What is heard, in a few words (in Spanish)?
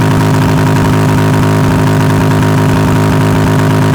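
MOTOR MARCHA YBR, motorcycle; YBR125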